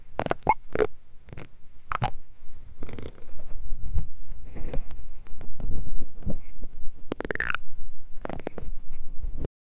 gurgle and growl with cli

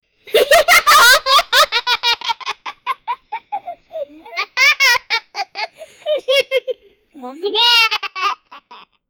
a children is laughing

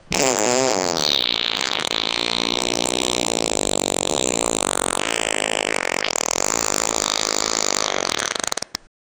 long and loud fart

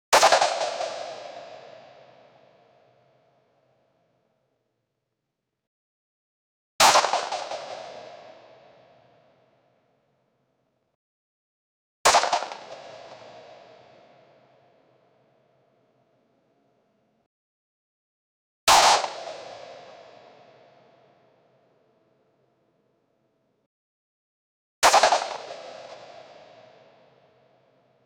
one shot from assault rif

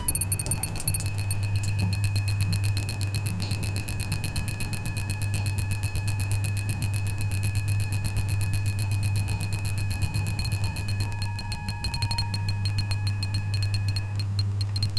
ambience sterile hospital

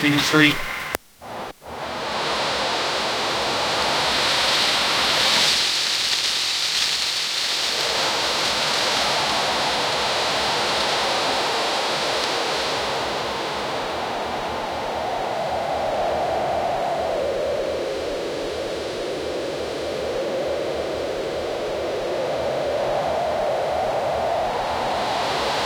an ever changing wind mov

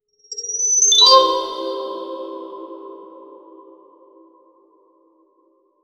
single luminous tear

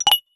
professional ui sound

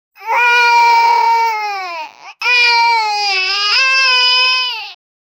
child crying in pain

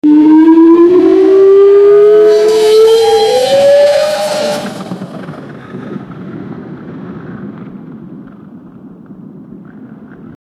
sound effects rolling big

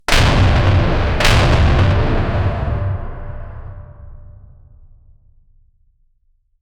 cinematic heavy metal

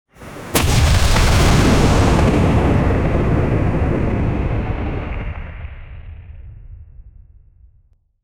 short and powerful stone

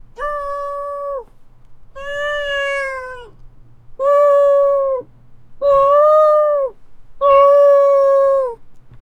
yak animal sound

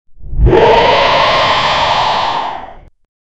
demon roar

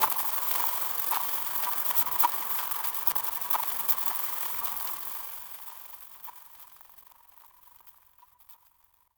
click synthetic

